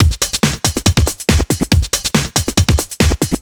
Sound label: Breakbeat 140 BPM